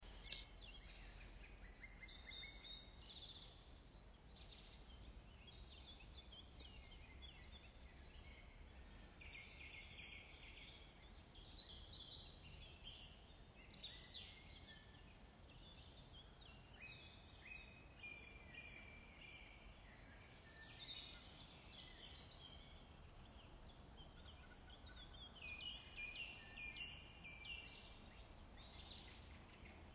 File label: morning forest sounds; along krummbachtal